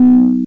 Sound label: Drums, Hits; DCM8